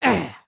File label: Run; Lego Strombie; creatures organic